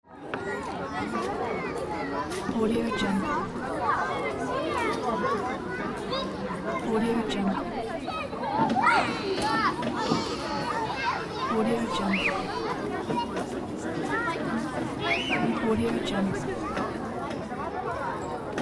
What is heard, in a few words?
children playing outdoors